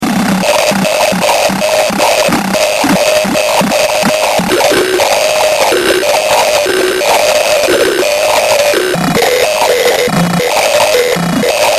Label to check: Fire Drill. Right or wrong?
Wrong